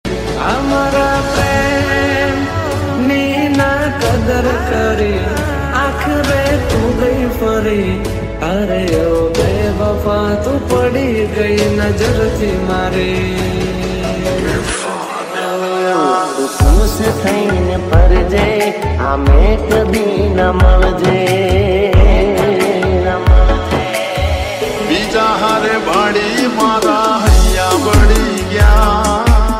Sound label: Gujarati Song Ringtone